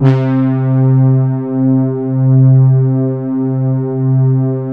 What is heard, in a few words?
OBERH, PADS; Vintage Voltage